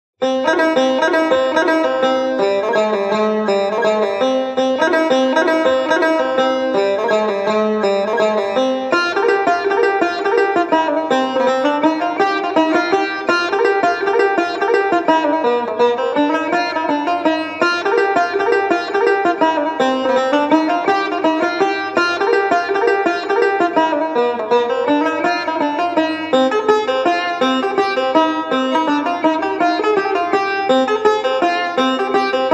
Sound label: Segah Practice Tar; fifth book